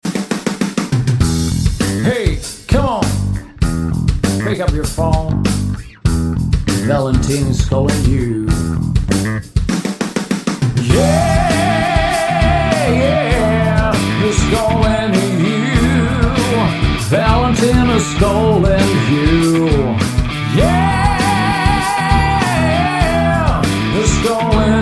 rock ringtone

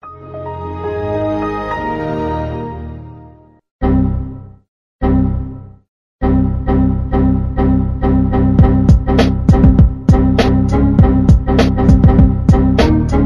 remix ringtone; windows

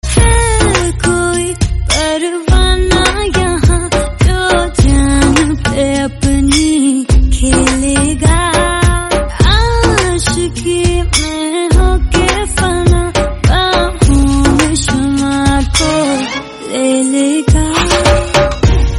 romantic bollywood song tone ringtone

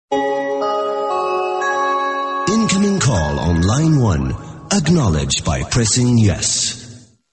incoming call background music tone ringtone

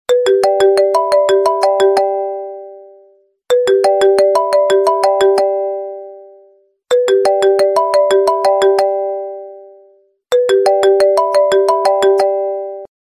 apple iphone 4 ringtone

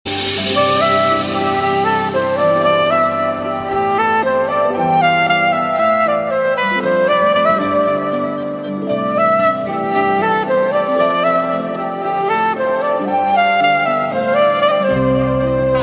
love ringtone

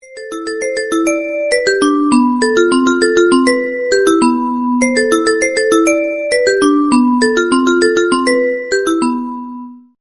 happy heart ringtone; nokia

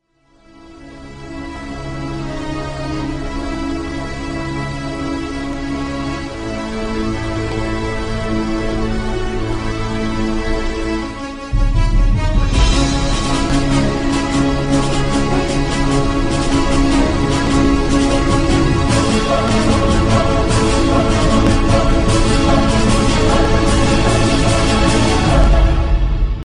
violin orchestra ringtone

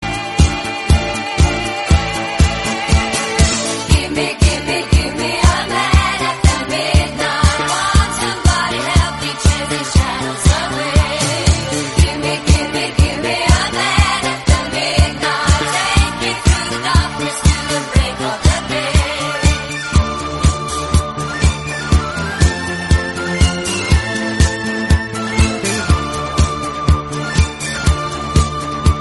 English song tone ringtone; english ringtones

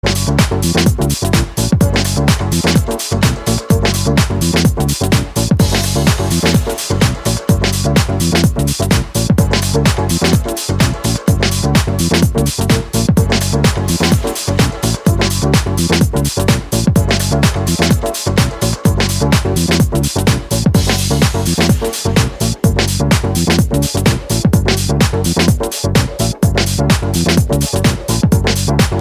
electronica fast music tone ringtone

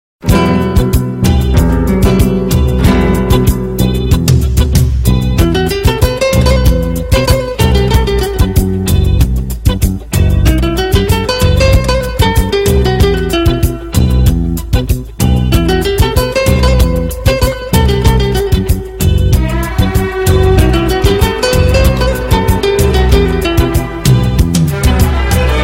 sweet mandolin ringtone

